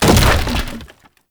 Crate Wood Medium Destroy; Objects; Destructable Wood Crates

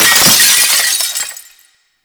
Generic Glass Break

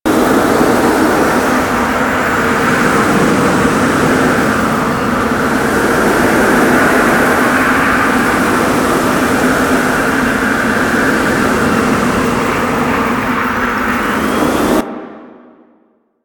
Wind Boost Loop; Warframe; Duviri

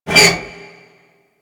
Frame Movement; DE; Warframe; Vay Hek

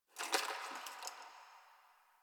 Ice Crack One Off

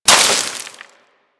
Melee Impact Dirt; Warframe; Weapons

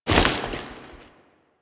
Foley Step; Warframe; Kahl

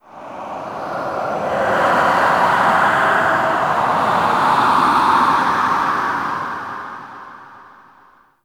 Death Wind Gust Low; Ambience; One Offs; Red Night

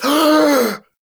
Friendly Revive Complete Gasp; Friendly Grineer; Warframe; Veilbreaker; Kahl

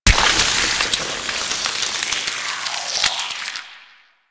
miasma impact; Warframe; Saryn

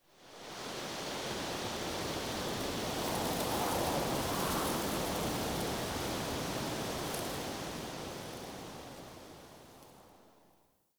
Wetland Forest Day Wind Gust Relaxed; Ambience

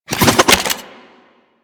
Fist Spin; DE; Warframe; Weapons; Archwing; Melee; Grn Arch Regor Fists

